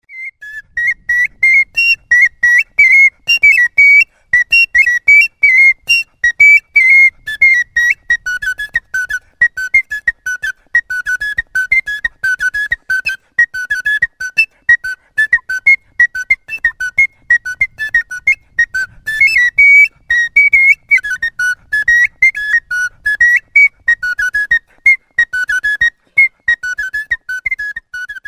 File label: yerbik, ghana bimoba